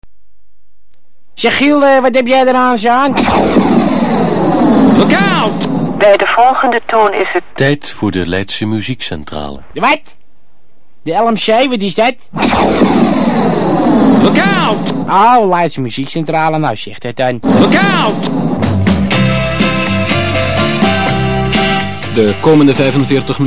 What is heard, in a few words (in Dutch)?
tune Gevarieerde muziek uit studio